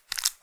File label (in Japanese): くちゅ音単発